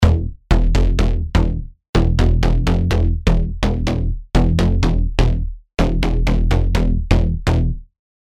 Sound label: Bass Loop E (125 BPM); TECH HOUSE